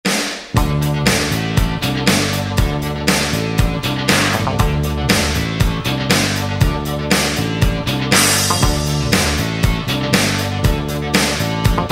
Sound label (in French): accords